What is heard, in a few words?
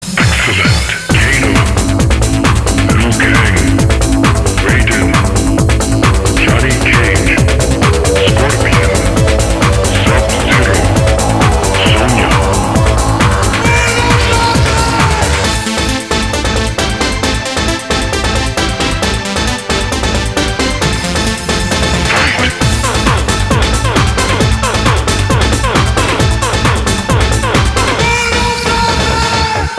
techno; mortal kombat